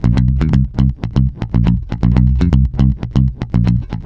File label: C, groover, bass; Spectrasonics; Bass Legends